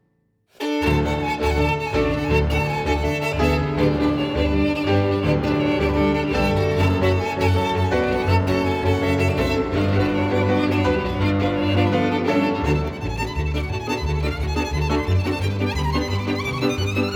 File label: Romanian Folk Dances; B. Bartok; Maruntel